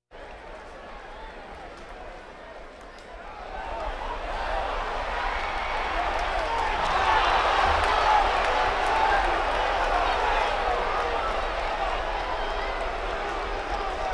Crowds Race cars Baseball

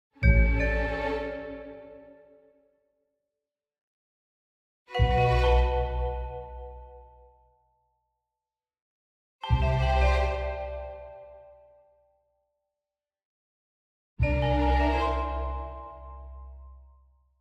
Orchestral Jingle Neutral

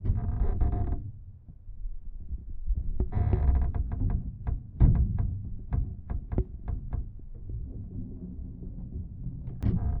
bed, household; NS Studios - ultra sound collection

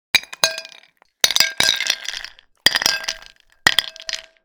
ice into plate; NS Studios - ultra sound collection

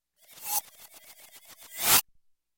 Space Space Jitter, Electronic; Sound Ideas 2000 Series

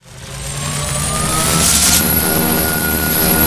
Elec Energy Charge Loop; SFX Kit; Sci-Fi Loops

